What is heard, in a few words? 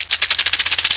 Drum Bean Pod